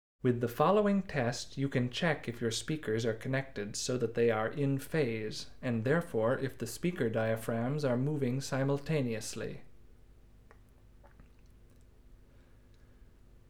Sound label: Test Signal